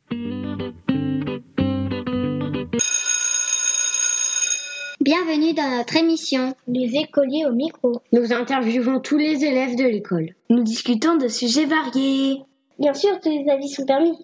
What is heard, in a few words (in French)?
jingle